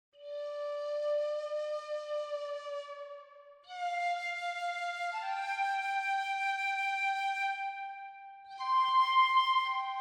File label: boneflute normal